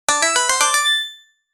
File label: short sound, confirmation